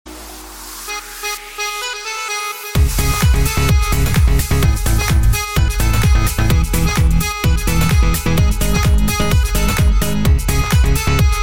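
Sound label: Elegant Tone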